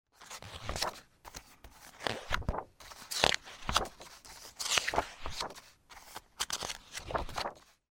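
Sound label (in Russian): жесткие страницы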